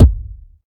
reverb bass drum single hit a key, Royality free kick drum single shot